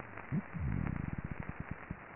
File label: E guttatus